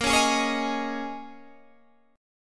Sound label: G sharp Suspended 2nd Suspended 4th, guitar chord